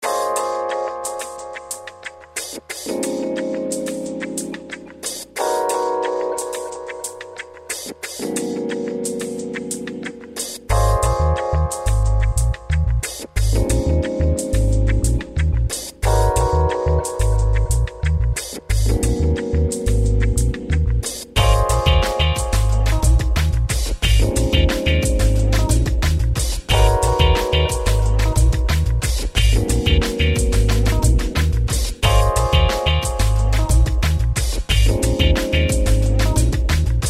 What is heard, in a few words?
r&b